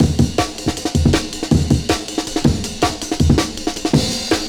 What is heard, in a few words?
160, classics breaks; Drum&Bass; EZRollers